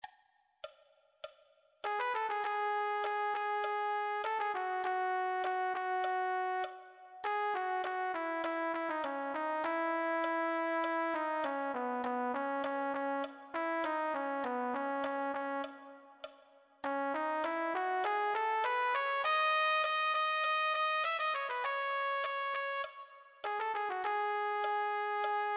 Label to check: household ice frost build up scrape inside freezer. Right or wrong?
Wrong